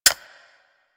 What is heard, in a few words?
M16 Mech Clip, rifle; Wraith